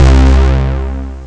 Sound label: JUNO2; Amiga Soundtracker sample